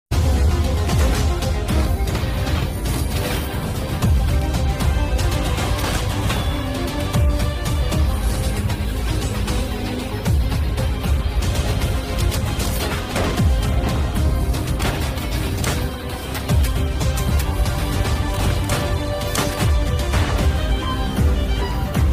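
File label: trailer background music ringtone; Vedaa; trailer bgm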